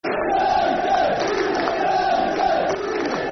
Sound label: United, Toon, Football Chant (Soccer Song); Newcastle United Nufc; fanchants-com